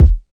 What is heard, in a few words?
Classic hiphop kick